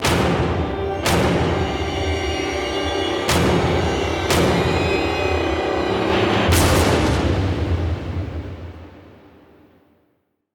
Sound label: Injured Music; Warframe; Cinematics; Nightwave; Zeal Preloid